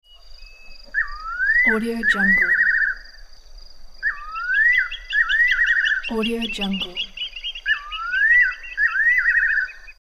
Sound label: fiery necked nightjar calling